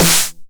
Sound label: 909 SD Tape Sat